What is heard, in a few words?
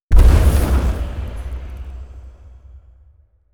shadow explosive kunai explode